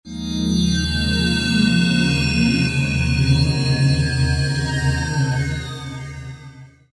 Metal Wrap, Roland V-Synth; Factory Patches

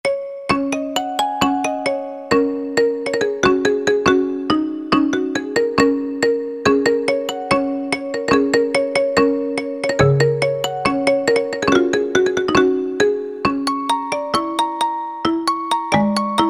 prc, E-mu Proteus FX; Submerge

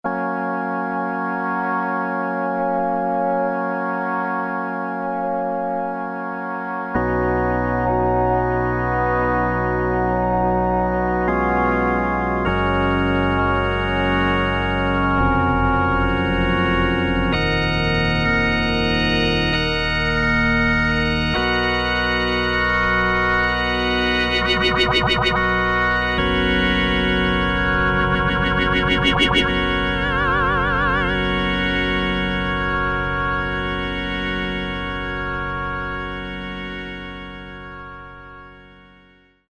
Space Cathedral, Korg Poly-61M

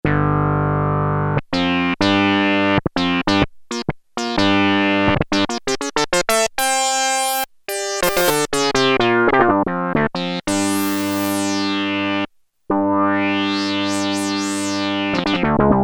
Quack, Prophet-5; Sequential Circuits Prophet-5 (Ver.3); Factory Patch; File 2 Programs

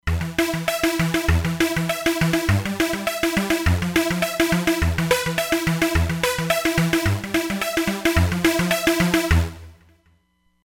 Digi Seq, Roland Fantom XR

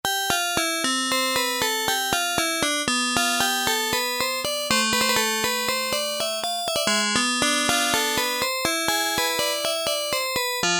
HARPSICHORD, Yamaha SHS-10

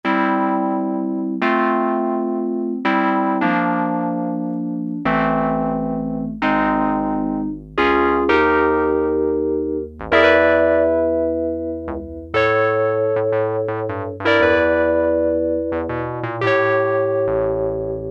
Elect. Piano I, Roland Juno-106; Factory Preset

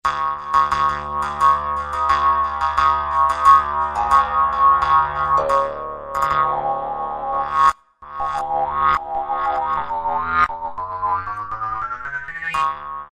Jaw Harp; World; Roland SR-JV80-05

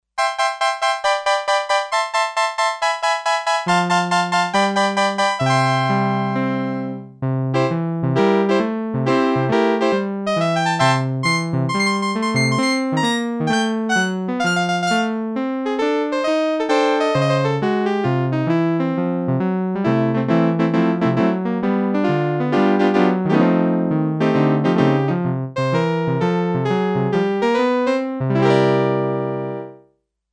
Piano 2, Sequential Circuits Six-Trak; Factory Programs

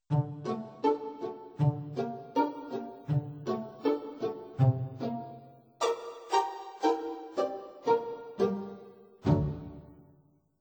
pizz strings

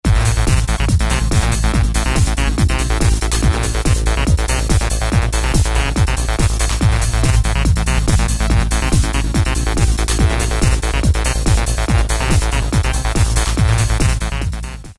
Goa Trance Pattern, Roland MC-303

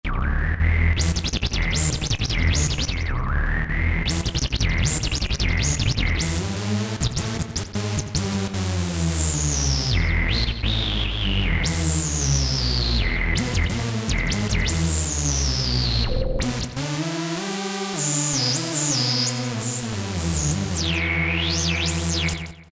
Roland JP-8000 Out of Control; Factory Patches

